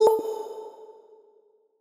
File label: FX Vocal Impact (A); Vocal Impact 4